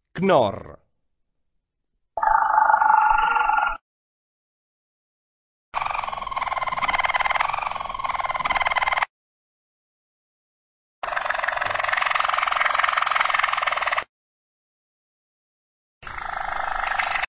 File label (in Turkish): CHORR KNORR, Malinua Kanaryası, Knorr, pes tonda ve derin ötüşlerdir. Motor sesini andıran bu ötüş; nadir rastlanan ama değerli bir nağmedir